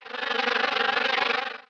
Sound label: Wing Mutant Flying; Playable Tutorial Level; TR3